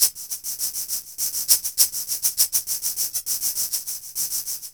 Shaker Echo; Ambient; Sonic Foundry (Sony Creative Software); Ambient Atmospheres & Rhythms

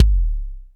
BD, Bassdrums, Roland TD-20

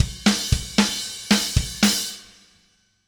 Haze Beat Ending; Sonic Foundry (Sony Creative Software); Indie Rock Anthems; Session 06 - Haze

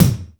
WET HEX, East West - Global Sounds; D4 BD SD TOM

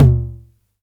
909 MT Tape, Toms; Goldbaby Tape808 & Tape909; The Tape 909

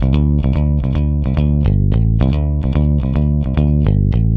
Rock, Bass; Bass X

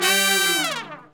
R&R Horns, R&R Falls; Roland L-CD702

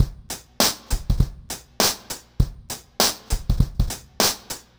Remixed Drums 100BPM; The Roots Drumloops

